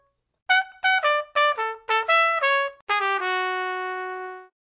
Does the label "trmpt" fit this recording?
Yes